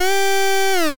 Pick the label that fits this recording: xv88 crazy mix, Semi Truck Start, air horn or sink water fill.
air horn